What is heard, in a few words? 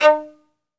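solo violin, strings; ILIO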